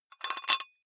dumbbell thread take off small weight soft, fitnessstudio; sport freizeit